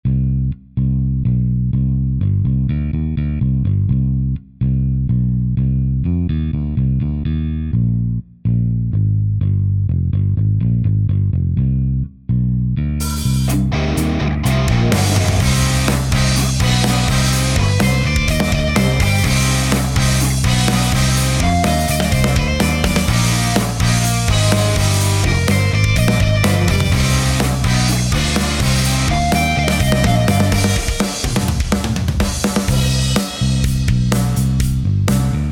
intro to chorus

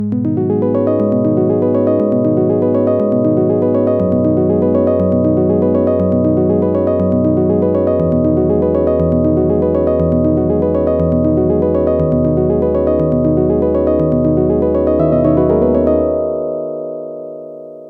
dexed synth arp stay loop

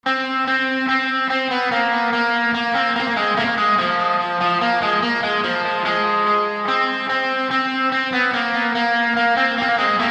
melody

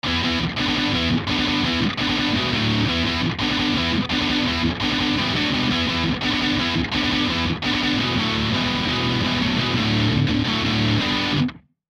gtr melody

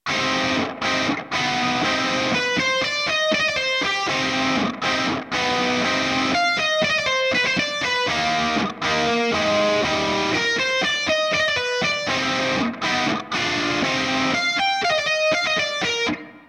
unnamed guitar riff

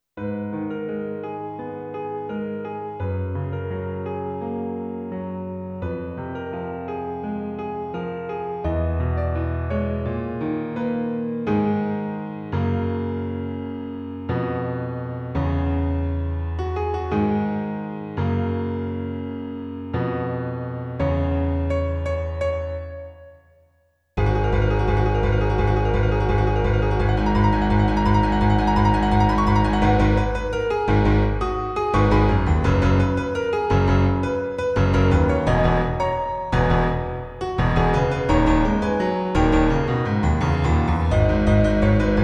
piano arrangement; jjba golden wind

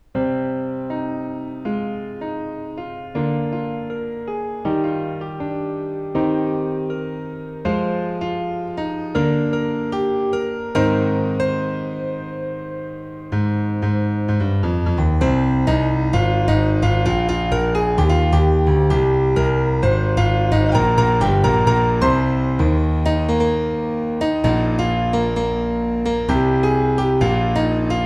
piano arrangement; neon genesis evangelion

